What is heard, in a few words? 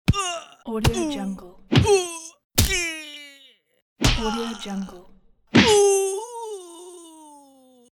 body hit with voice